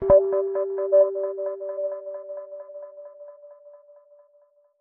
Special Photo Impossible Triangle Plong; Sx Special-Photo Impossible-Triangle Plong 03

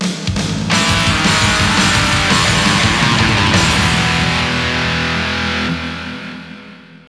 Str G#

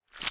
Footstep Paint Rev; Sx Footstep Paint Rev 01

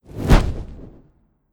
Torch

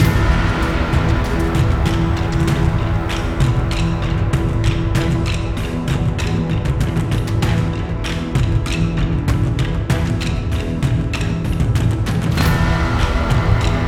Battle

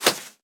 Pigeons; gamerip

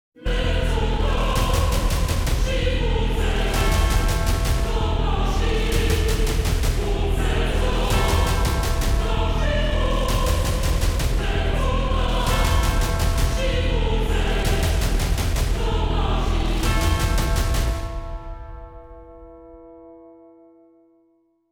Music Phase 3 Part 2; Azrael

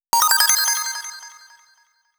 Sound Logo; Sphere Knight; Pepper Bomb Sound Logo

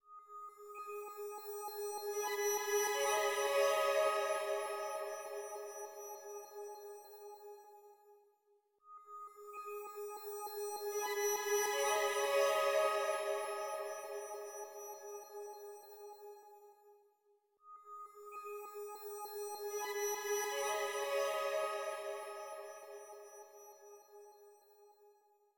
Mechanic Progression Stinger

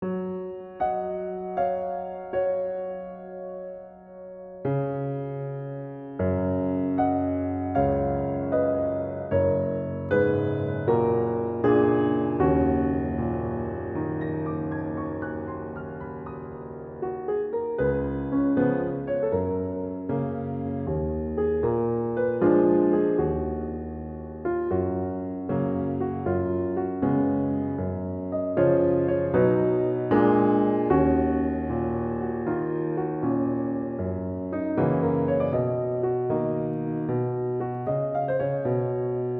Cloudland Swing; Katawa Shoujo; Enigmatic Box of Sound

